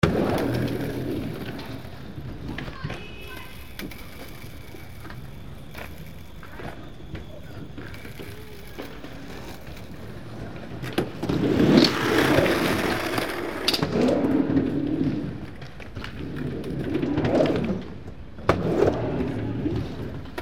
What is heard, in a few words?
funzone